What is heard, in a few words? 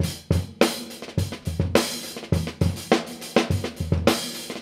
Drum loops Boombap